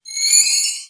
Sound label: Currency Cash Flyoff